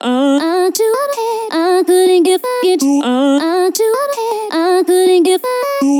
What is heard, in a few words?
Vocal Chop Loop 160 F#; Vocal Loops; Ghosthack Advent Calendar 2018 Day 9